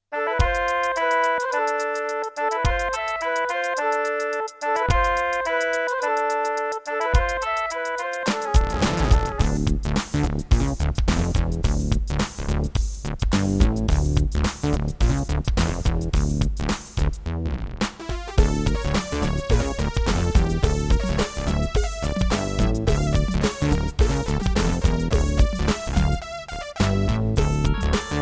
Boss Dr. Synth DS-330; Lost Vikings

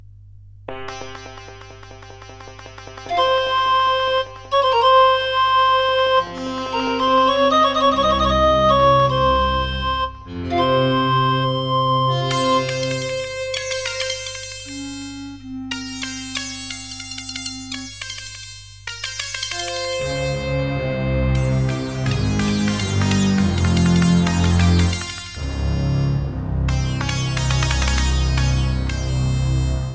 Intro, Yamaha DB50XG GSMODE; Prince of Persia 2 - Intro

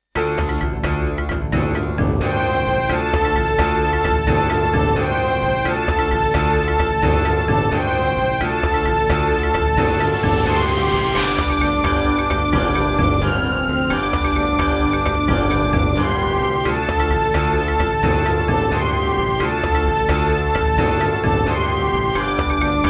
Diamond Monster Sound 0.5MB; Heretic

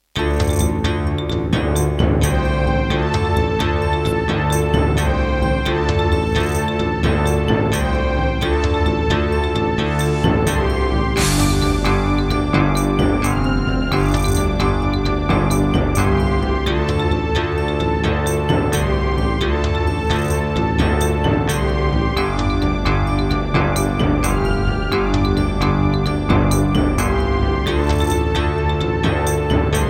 Roland SCB-55, wavetable; Heretic

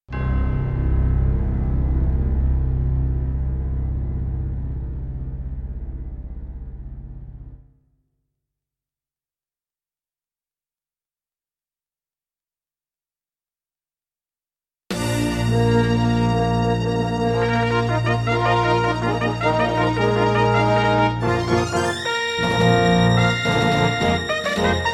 Intro, Roland SC-88ST Pro 88MAP; Dark Forces

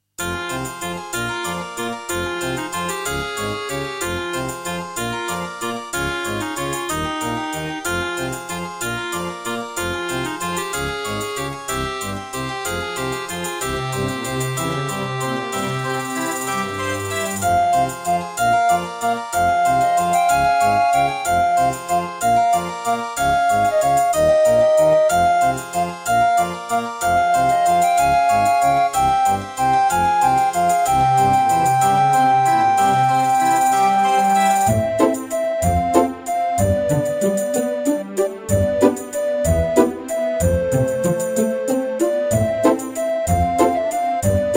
NEC XR385 (DB60XG Clone) GS MODE; Death Gate

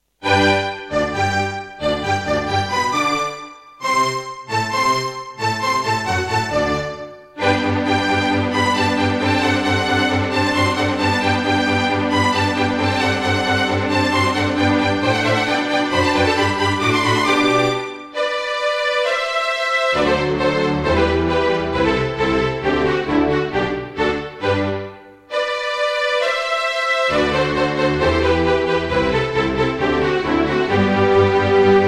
Serdaco Dreamblaster X2GS BURAN V1.1; Mozart - Eine Kleine Nachtmusik partial; classical

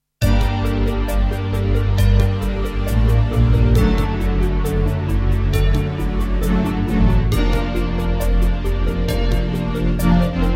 Midiplus MiniEngine USB; Quest for Glory 4; QFG4

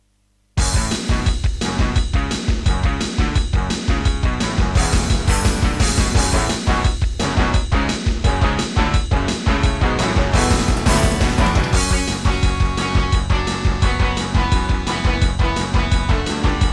Intro, McQub; Serdaco; Duke Nukem 3D

